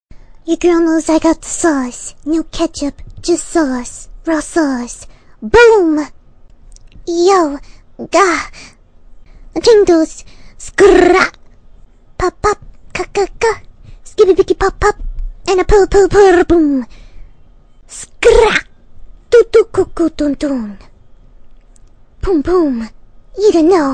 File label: Singing; Fluttershy